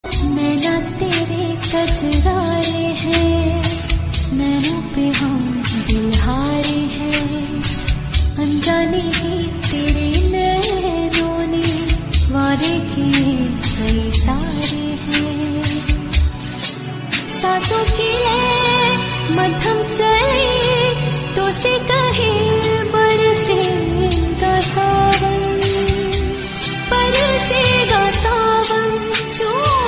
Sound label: Female Version Ringtone